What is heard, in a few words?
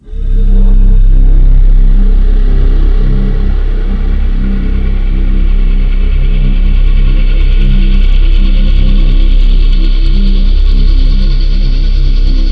white train, Synth; FreelogHS10